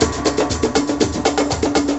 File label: Drumline Loop